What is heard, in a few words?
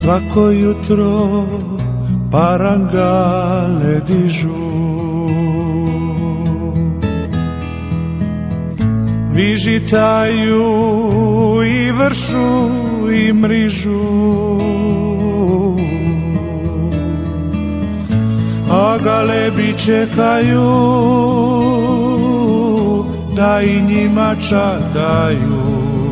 romantic croatian